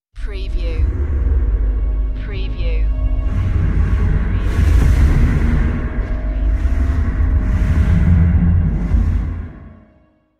Dark Production Whoosh

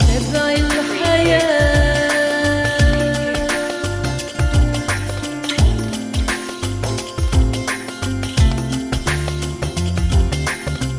electro world wide syria loop

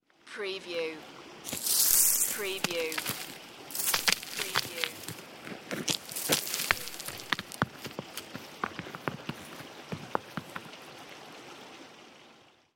Leaves and rocks fall into river

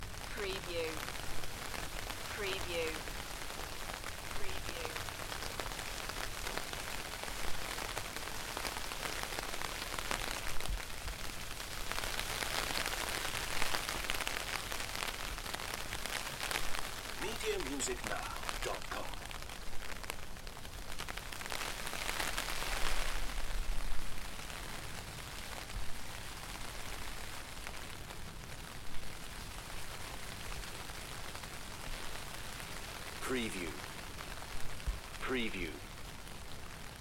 Heavy rain; beanstalk audio

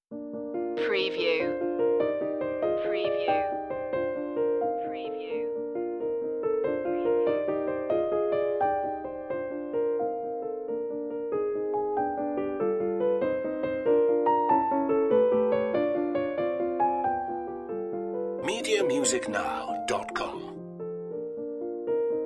tchaikovsky new doll piano

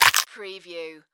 Bite Chomp; sound-effects-bible

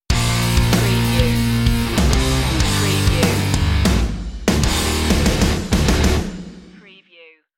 exotic aggression stinger

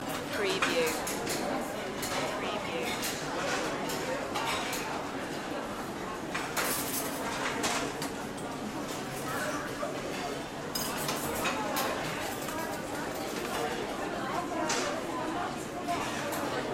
big canteen ambience loop

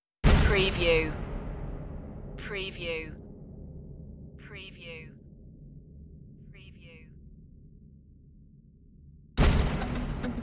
where spirits dwell drums only

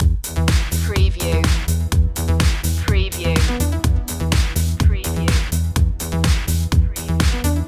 fireflies loop

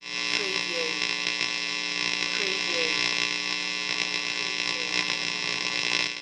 Electric Buzz Bright

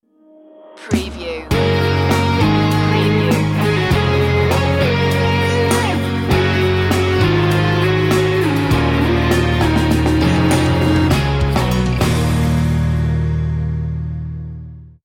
cloudy day sting

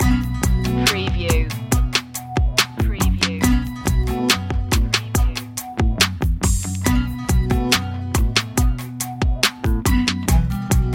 deep hip hop loop

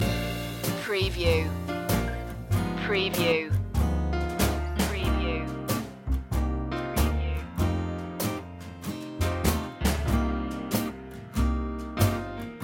broadway stroll loop

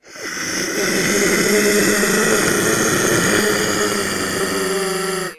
Monster Animal Groan long

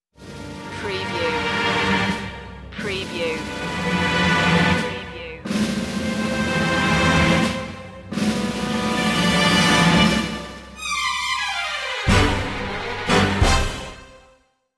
sting no choir

